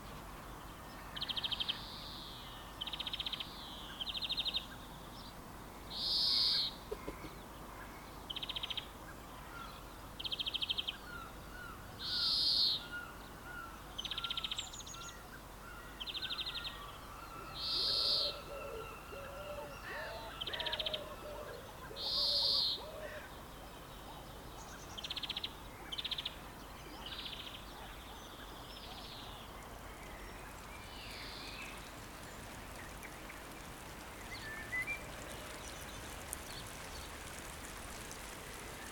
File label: Early morning rain